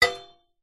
impacts metal hollow frame hit sharp strike

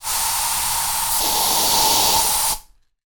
household aerosol bug killer spray; zapsplat

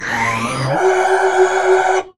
Robot Talk; little robot sound factory

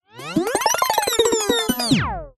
musical music speed up stop

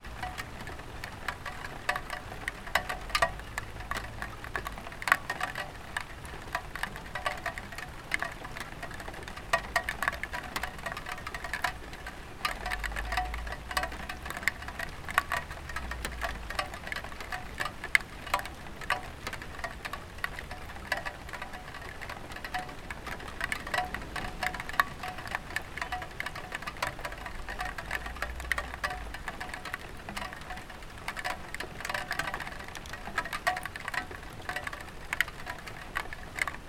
rain light roof

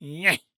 FUN MONSTER mischievous attack sneakily; Diablo

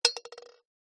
food egg drop into pan of water; zapsplat

